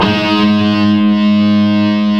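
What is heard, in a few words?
guitar power chord, distorted; Power Chords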